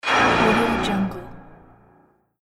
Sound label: war result fail